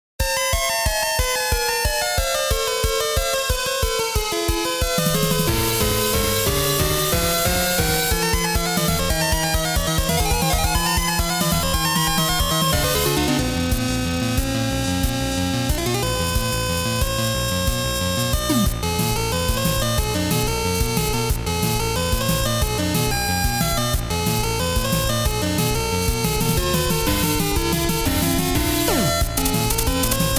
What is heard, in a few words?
8bit Battle; Everfree Radio